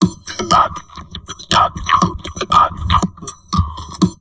TALK, Drum Loops Crazy Processed

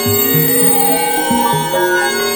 A#4, TURBOBELLS; Northstar; The Wizard VOL-1

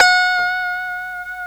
G 5 FUNK POP, GUITARS, FUNK STRAT; Spectrasonics; Hans Zimmer Guitars VOL-1

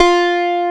AN SYN, SYN & LEAD; SAMPLE WAVE MIXING 2

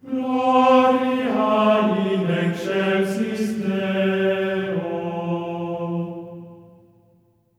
GLORIA F4, Symphony of Voices; Spectrasonics; GR GLORIA

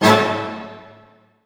D# MAJ, Orchestra, ORCH HITS; Sound On Sound; Peter Siedleczek's Orchestra

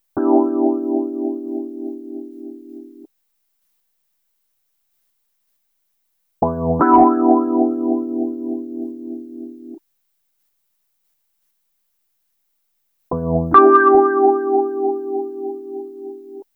EP Chords, Electro Lounge; Sonic Foundry (Sony Creative Software); 072 Green Street

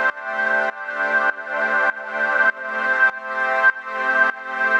Pad dbx 100 A, sidechained

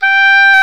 Oboe; Oboe f; Roland L-CDX-03 Disk 1; Oboe 4-8

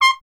Trumpet C 2; Miroslav Vitous - Solo Instruments VOL-1

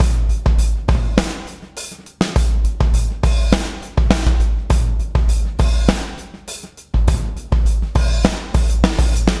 BLOW, BLOWUP

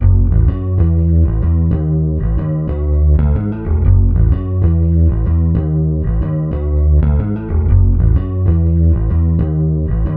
Bass Guitar Loops

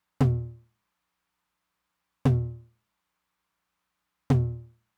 Low Tom, Roland TR-909; Roland TR-909 Ableton Project

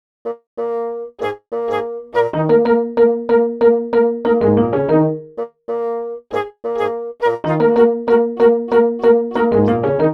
Horn Combo

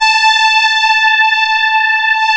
CMI ORGAN; Wizoo - Powered DX

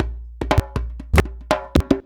119 DJEMBE, Modern Percussion Loops

